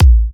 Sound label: A, kicks; markus hakala kicks